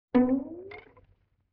LoFi Violin String Pluck; Musical Elements

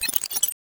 Glitch

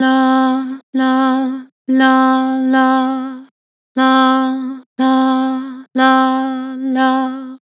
Vibrato "Lah", Harmor Vocals

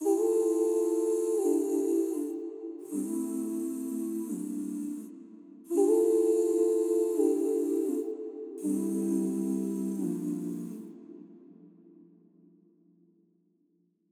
Angels Vocal Wet, 84 BPM G Min; Cymatics; Infinity Vocal Collection

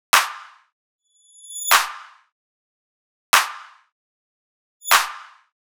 Drum Loop 150 Clap; Loops; Ghosthack - Color Bass Essentials

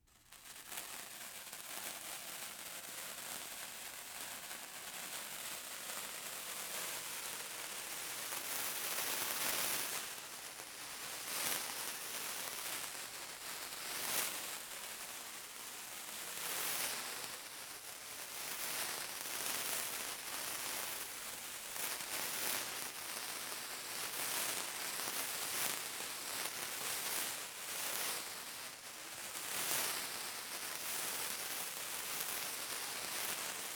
Sparklers Fluid Movement Up; Fairlight Sound Lib

